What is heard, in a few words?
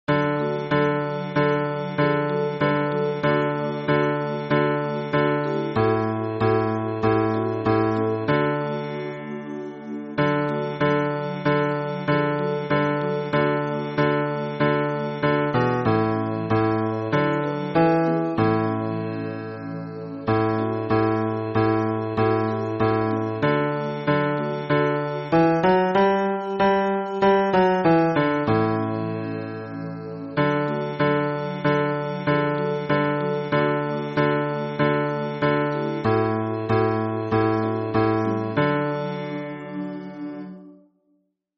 hymn bass